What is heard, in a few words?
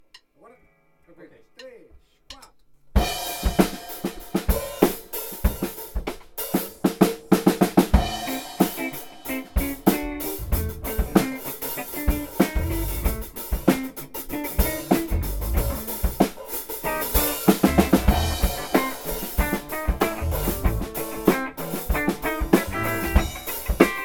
FunkyPart